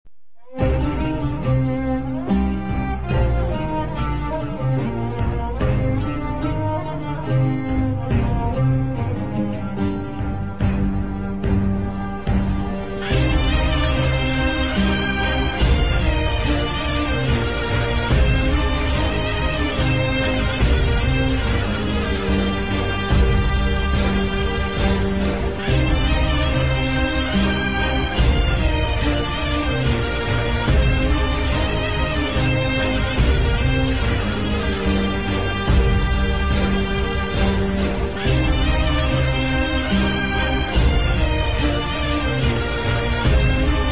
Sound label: music zang mobil torki; زنگ ترکی معروف اینستا